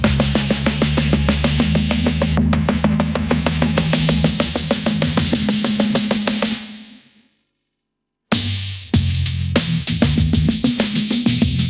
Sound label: groovin funky bass jam, ringtones